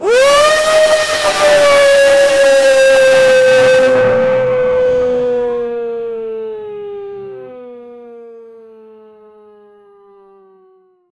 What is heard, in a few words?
an alerted sound of some, infected